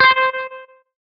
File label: Hitsound menu note